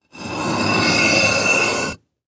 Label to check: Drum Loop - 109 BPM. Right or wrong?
Wrong